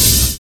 BIG ROOM; Univers Sons - Kitch 70s

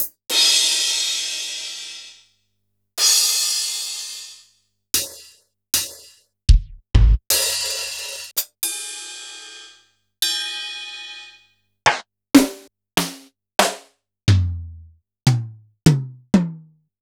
Kurzweil Kit

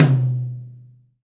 TOM, Roland, Real Kit

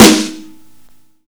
Snare Warm, Drum and Bass

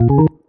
ORGN 123, Keys; Houseworx

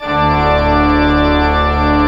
PED, Pipe Organ; E-MU Producer Series Vol. 2 – More Studio Essentials; Keyboards

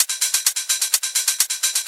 Hihat Loop 128 BPM

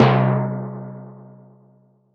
Timpani, Roland BK-5 Percussion

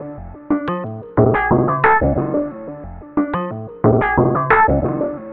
Sequence, Drums & Drones

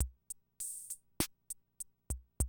CR78, DrumLoops

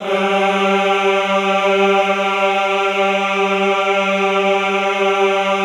EHH G2, Classical Choir; Best Service; Extended Classical Choir; EHH MIX

